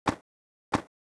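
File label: Turtle Walk; Gamigo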